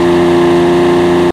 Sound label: Nissan Skyline R34 High